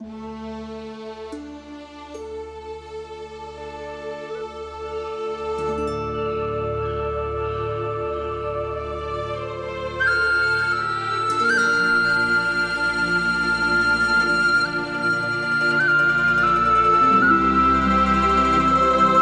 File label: Scene Music; Age of Wushu; Windows